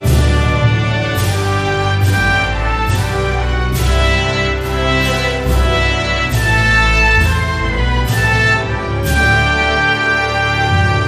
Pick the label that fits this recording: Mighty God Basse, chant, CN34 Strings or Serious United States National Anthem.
Serious United States National Anthem